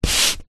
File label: paper sheet a4 push by hand on table; foley